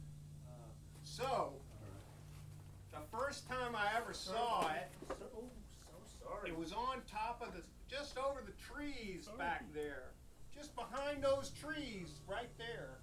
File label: Surface Noise